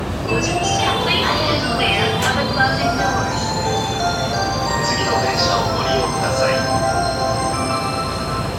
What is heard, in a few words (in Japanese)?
京浜東北線有楽町南, train sounds